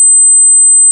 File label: Unlock; Shopping cart; Rocateq